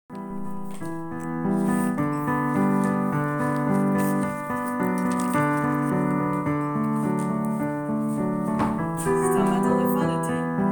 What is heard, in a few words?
musique